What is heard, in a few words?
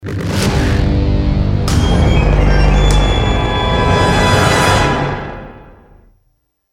deathcam, music; Counter-Strike Global Offensive